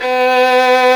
STR SOLO, String; Roland - String Master Series; Chamber Grp1

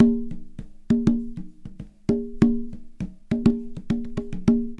con; Percussion

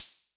Hi Hats Open; LIMAT Div Dept

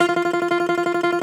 domra, Russian Strings; World Combos; E-MU Producer Series Vol. 7 – Old World Instruments

